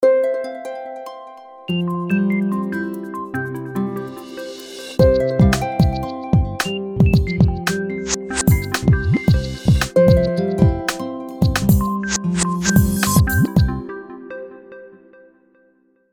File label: Motif; Itel; Power-80